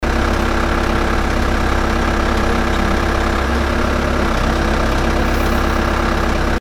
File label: digger working engine loop